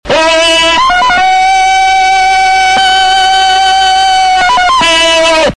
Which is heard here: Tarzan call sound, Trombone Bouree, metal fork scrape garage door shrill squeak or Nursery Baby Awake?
Tarzan call sound